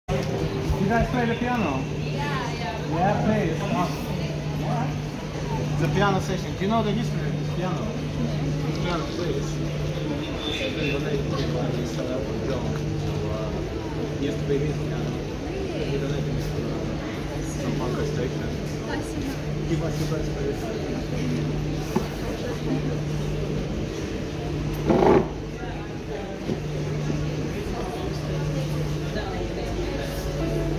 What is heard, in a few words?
cover on a public piano; interstellar